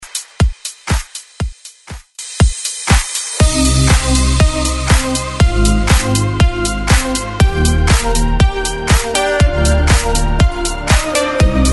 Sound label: Club mix